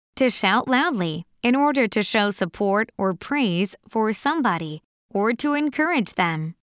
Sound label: cheer m